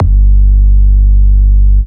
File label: Bass Shot G